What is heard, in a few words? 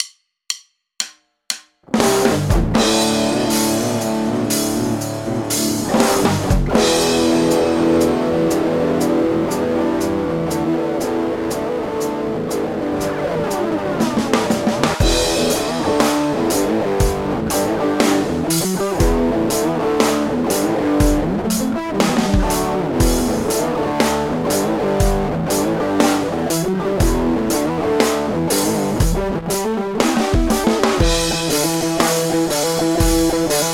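drums n guitar mix; Joe.The.Human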